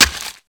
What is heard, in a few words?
knife hit, weapons; garrysmod